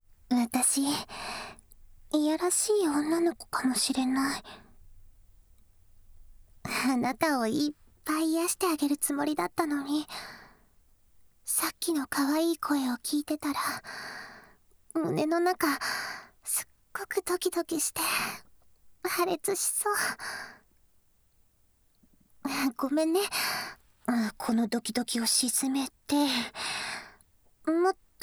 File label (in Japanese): キスからの耳舐め